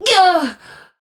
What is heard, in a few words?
Pain; Warframe; Tau; Dax; Ninja